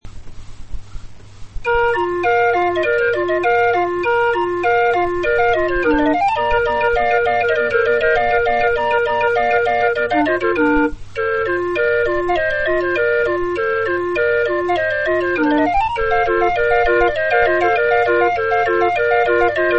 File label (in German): floetenuhr